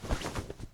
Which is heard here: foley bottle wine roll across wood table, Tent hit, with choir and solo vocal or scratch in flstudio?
Tent hit